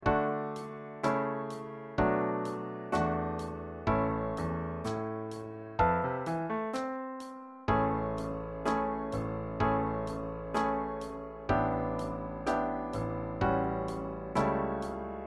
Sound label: Piano Drum